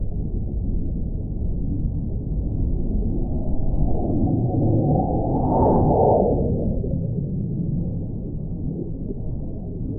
Japan mountain ambiance

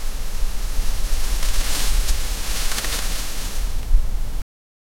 crunching of snow under

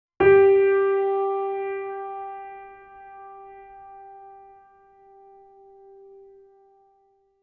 piano only g c d g light and

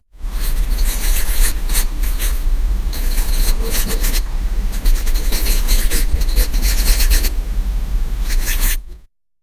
cozy game menu option

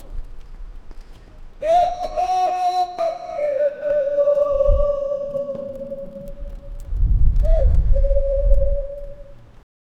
kerala chenda beating; india

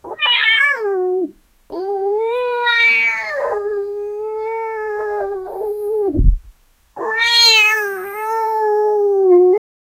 two cats fighting and meo; feline

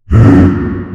creature

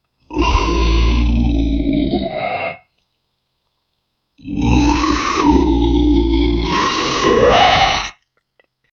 a vampire monster gruntin

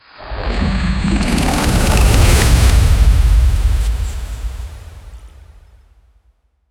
epic aura power up sound

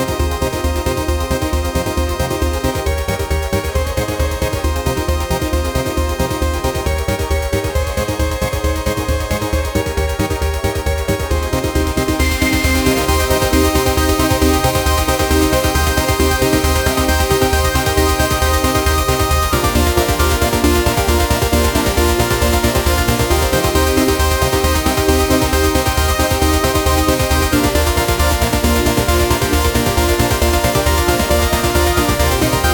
fast paced 8 bit chiptune

